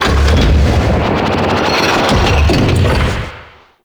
Distant Mechanics; Ambience; Warframe; Corpus Railjack

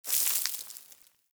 Settlement Sand Fine Debris; Warframe; Grineer Settlement

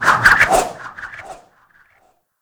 Whip Spin, Weapons

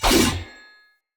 Dagger Heavy Whoosh; Weapons; Dendrit

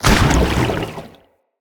Dendrit Staff Caster Proj Impact Damage; Weapons; Staves

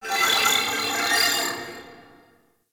Ship Data Chatter; Warframe; Corpus Ship Remaster

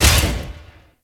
Garage Door Close; Gameplay; Warframe; Venus Open World

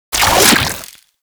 Rifle Charged Grapple; Warframe; Infested; Quanta

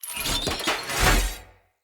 Sword Heavy Attack Charge; Weapons; Enemy; Swords; Mnd King Swords

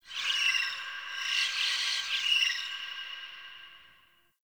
Distant Egg Cries; Warframe; Tau

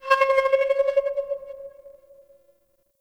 Asteroid Vocalized Metal; Ambience; Warframe; Grineer

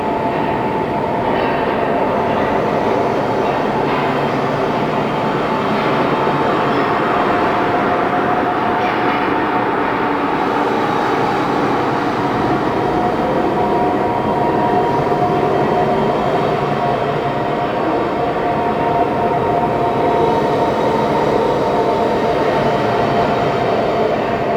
Shipyards Interior Ambience; Warframe; Grineer Shipyards

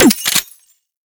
Shape Blast Shotgun Mech; Warframe; Weapons; Corpus

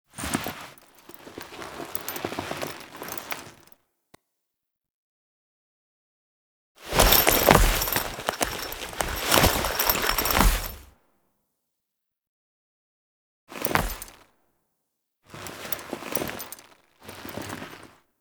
Death Foley; Warframe; Duviri; Cinematics; Teshin

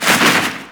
Unearth Proj By; Octopede; Warframe; ManInTheWall

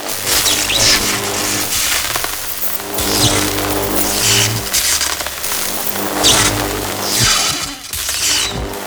Sniper Muzzle Spark; Warframe; Sentient; Caliban Prime Sniper

